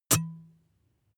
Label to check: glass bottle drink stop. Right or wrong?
Right